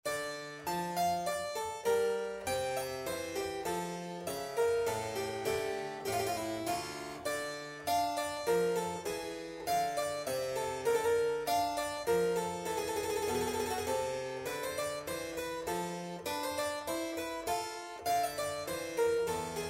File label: keyboard sonata in d minor, piano